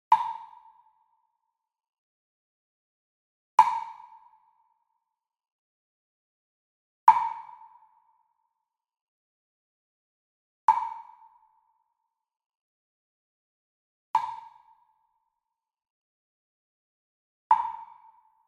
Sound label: Sweetener Jam Block Plastic Hit Medium; boom library; Cinematic Strikes Construction Kit